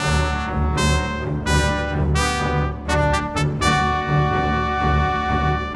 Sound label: Cinematic Orchestra; Sonic Foundry (Sony Creative Software); Orchestral Series VOL-3 - Cinematic